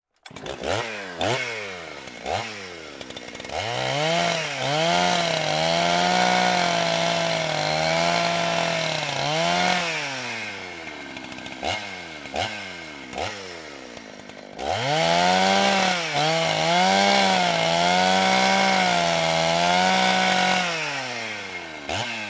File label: Chainsaw cutting a tree